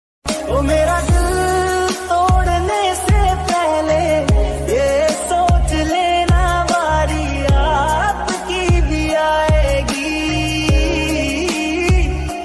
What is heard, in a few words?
heart touching ringtone bgm song broken heart touching ringtone